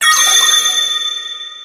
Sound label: freeze